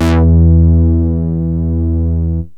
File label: ob bass, yamaha rm1x synth